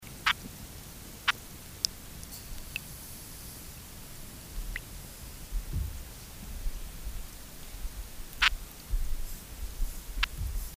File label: Dianema longibarbus, Amazon, soniferous